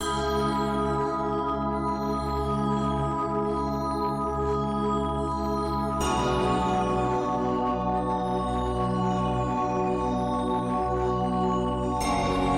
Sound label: PAD Shimmer Crystal; Synthelium; syntheway